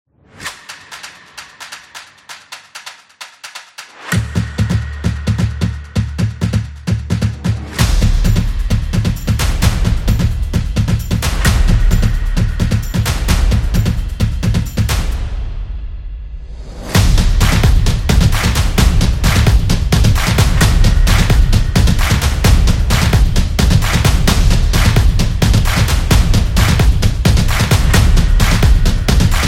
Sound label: This Is Drum Beat Motion; sports beat